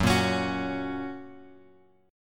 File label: F sharp Major, guitar; F# Chord {2 1 X X 2 2} strummed